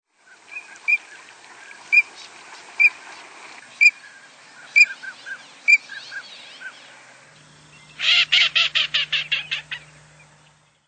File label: Green-winged Teal; Birds